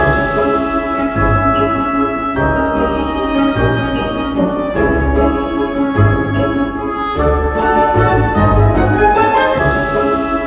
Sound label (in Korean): Comic 드라마